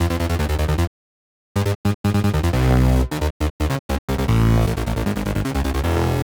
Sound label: synth; Pony Fan Music Remix Stems